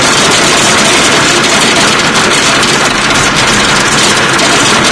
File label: distinct rain hard loop; ambient, ambience